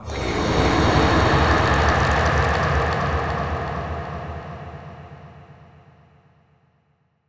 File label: Distant Absctract Scream Short; Monster Sounds; TheDeclineOfWarriors; Monster Sounds Volume II